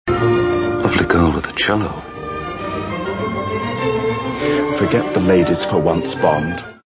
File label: cello